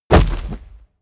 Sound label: sand bag drop; impacts